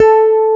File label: E BASS A3, Bass Guitar, STACK BASS; AKAI S6000 CD-ROM - Volume 1